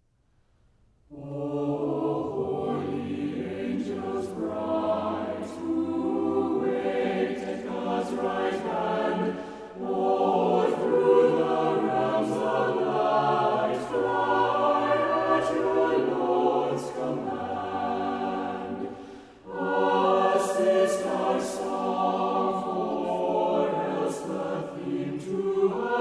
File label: Choir O Holy Angels